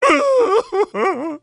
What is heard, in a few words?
King Crying